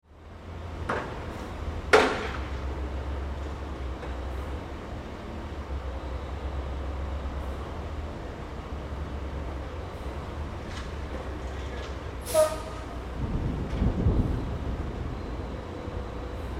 Ambience Construction Site Truck Activity Industry